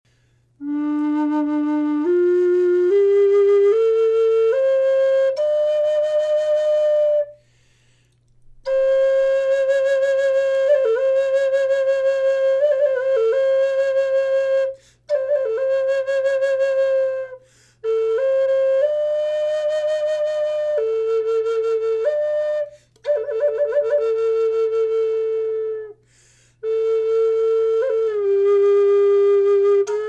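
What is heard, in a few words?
natural, wood flute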